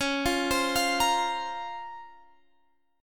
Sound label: C sharp Major 13th C sharp; arpeggiated